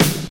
piercing snare drum sound d sharp key

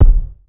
old school kick sample f key; kick drum samples

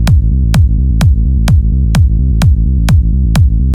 techno bass and kick tremolo; drum loops

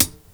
nice hihat sample B key; hat samples

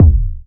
boom kick drum sample d key

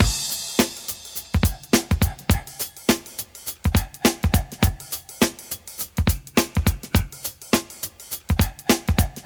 104 bpm modern drum groove g sharp key; drum loops

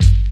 short bass drum single hit G key

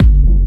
uk strong fat techno kick; single hits one shots; kick drum samples

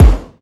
loud bass drum sound F sharp key; kick drum samples

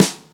dry acoustic snare sample f sharp key

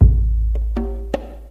eighties short reverb tom sound a key; Duration 0:02; tom drum

